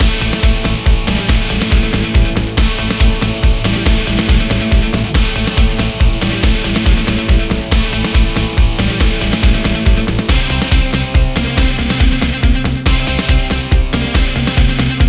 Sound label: BGM Fight